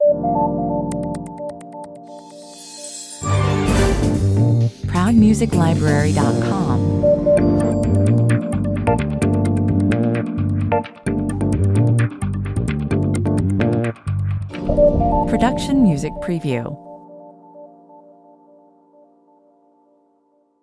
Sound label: business opener 130 bpm